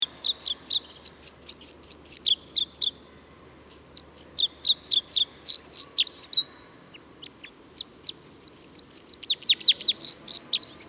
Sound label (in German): Fichtenkreuzschnabel; Klosterholz LA; Michle Peron